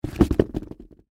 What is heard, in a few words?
Boots Set Down, Boots Set Down Shoes Drop; foley; Offices; Blastwave